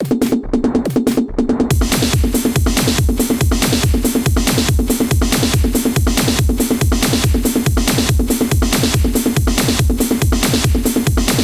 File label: Techno ring